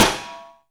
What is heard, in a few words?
small reverb steel snare drum sound b key, acoustic snare tuned to the B note